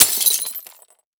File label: Impact Glass Smash With Hammer; Impacts